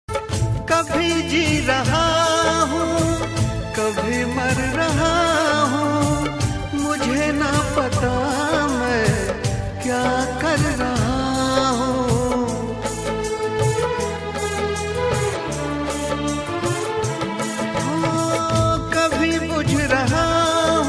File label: Old Hindi Song Ringtone